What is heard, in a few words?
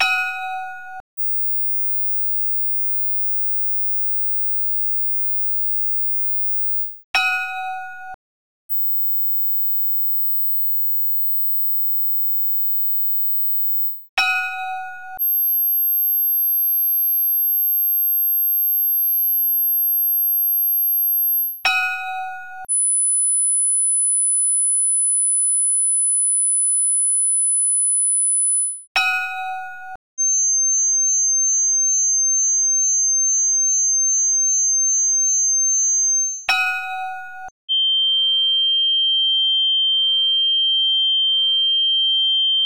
BELL 20KHz 20Hz; Spot fequencies